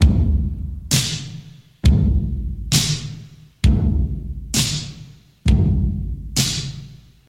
132 bpm old school drum loop sample c key, kick tuned to the C note